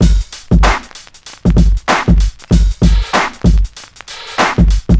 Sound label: 96 bpm drum loop sample g key, Free breakbeat - kick tuned to the G note. Loudest frequency: 702Hz